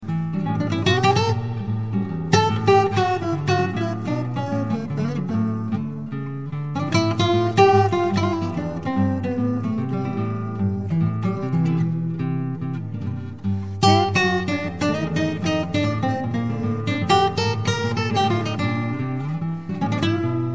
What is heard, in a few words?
tune up